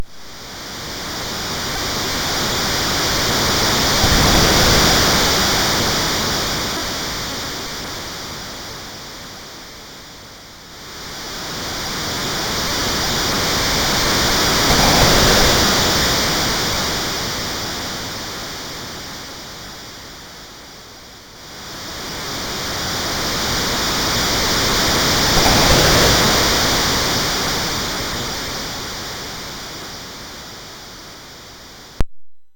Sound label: Window Shutter; MOS6581R4